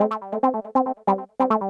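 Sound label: synth electro 140 A; Techno; Keys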